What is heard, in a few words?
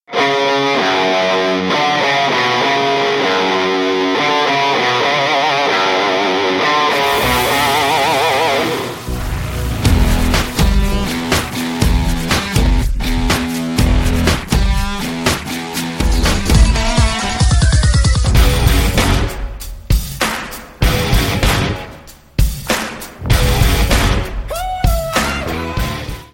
guitar